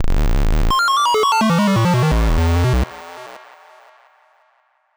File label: Synth Noise 85, hiphop 85bpm; musicradar